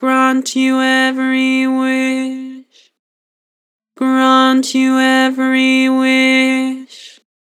Vocals Flat Grant you every wish; Harmor

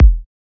Kick A, Psy Kick; Drums; Ghosthack; Ghosthack Advent Calendar 2017 Day 9 - Psy Kicks; Ghosthack 20 Free Psy Kick

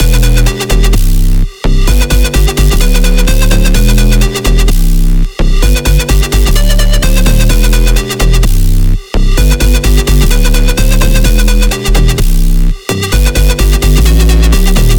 128 BPM E Min, House, Drop Loops; Goliath; Cymatics

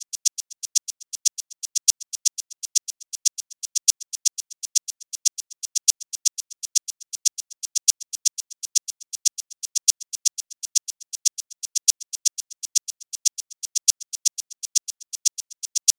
Shaker Loop - 120 BPM; Orchid; Percussion Loops

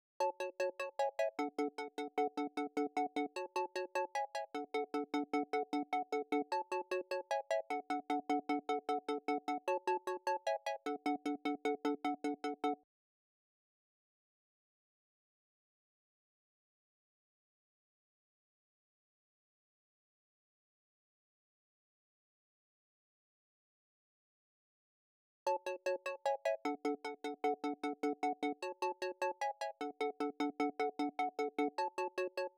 152 BPM F# Min Pluck; Cymatics; 2022 Melody Collection; Wavy; Off Route

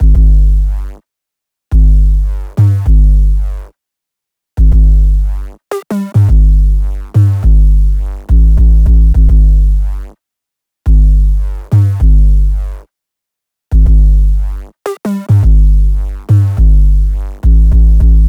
Sphinx - 105 BPM G Min, Melodies; 808; Loop Stems; Cymatics; PHARAOH; Pharaoh Premium Drum Samples (BETA)

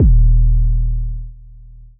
808 - D#; Drums - One Shots; 808s; Hip Hop

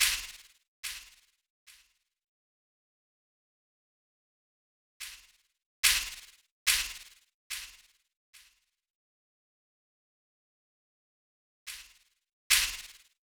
Drum Loop 144 BPM FX, Drill; Loop Stems; Cymatics; GHOST (Beta Pack); Arrived Drum Loop

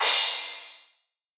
Riddim Crash; Hats & Cymbals

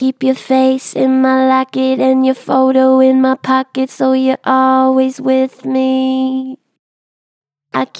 Pitch Flat Gentle, Vocals; Harmor Vocals

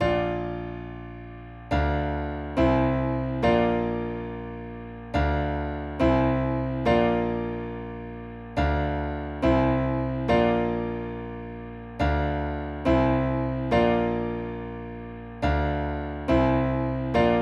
140 BPM D Min Piano; Loop Stems; Infinity Melody Collection; Cymatics - Candy

